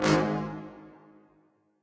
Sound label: fire